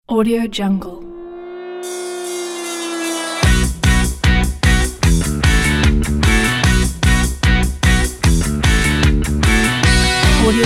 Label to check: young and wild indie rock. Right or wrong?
Right